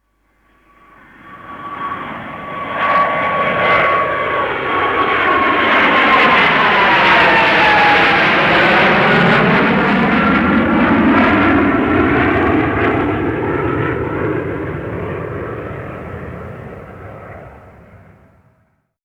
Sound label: BOMBS